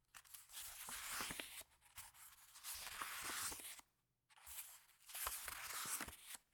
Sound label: turn paper small